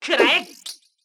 chain pipe; Mystical Ninja Starring Goemon

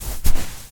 footstep sand walk; Poppy Playtime; SFX Environment ProSoundCollection v1 3 MONO Footsteps

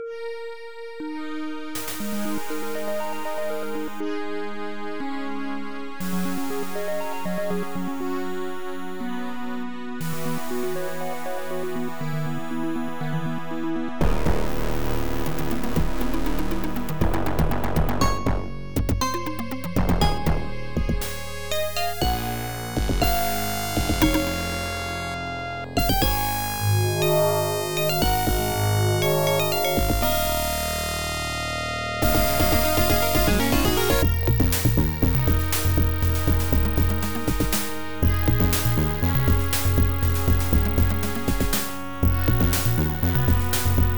Winning; AdLib music synthesizer card demo songs; IBM PC XT AT

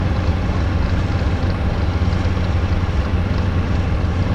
Ambient Engine Room; Among Us

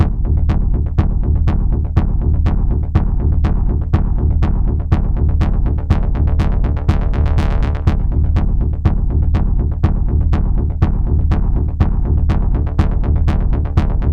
pulse

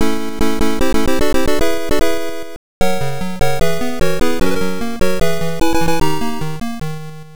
STAGE CLEAR; commando atari 7800

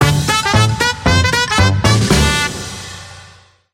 Lose Race; Angry Birds Go

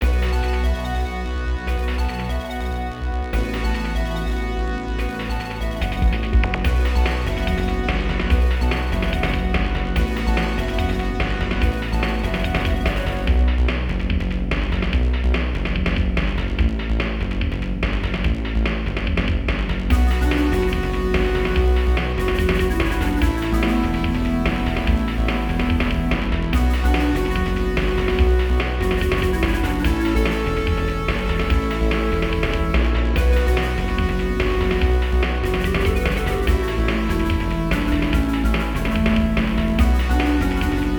Bamboo Forest; Shippu Mahou Daisakusen; Saturn gamerip

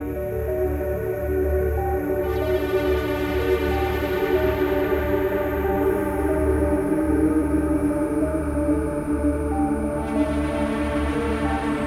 Breathey; Fortnite Save the World

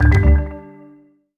Perc Star

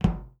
FOOTSTEP Generic Metal Hollow Run; mono; Don't Be Afraid The First Toy

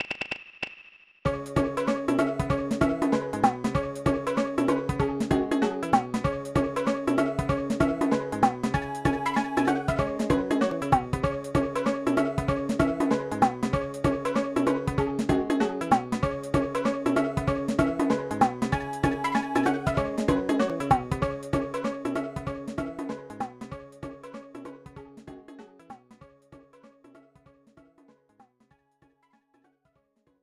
Congratulations!; power instinct; snes

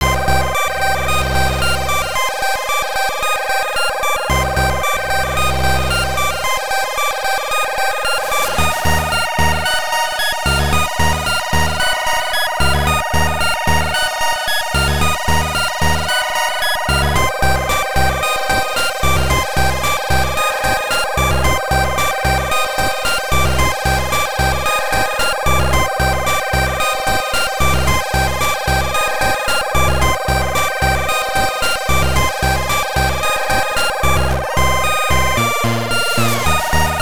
Mission Failed; RoboCop; Game Boy

